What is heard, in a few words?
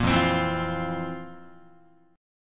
F Major 7th Suspended 4th F, Guitar; strummed